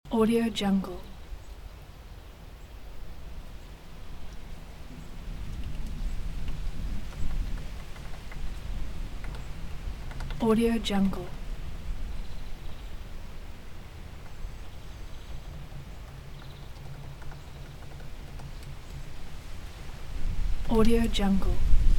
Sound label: wind in old trees with leaves loop